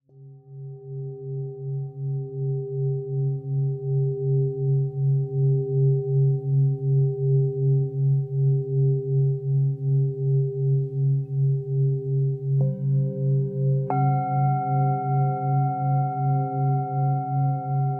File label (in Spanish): CUENCOS TIBETANOS sonido armonico